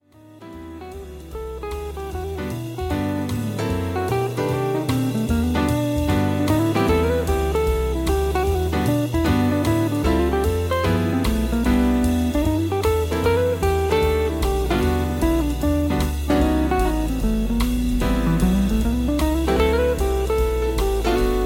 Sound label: instrumental